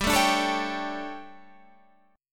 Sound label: D Major 7th Suspended 4th, chord